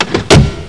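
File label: pincers; IO CD 1999 April; DARKMOON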